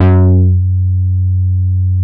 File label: DETUNE BASS, VINTAGE VOLTAGE; Masterbits - Vintage Voltage; VV BASSES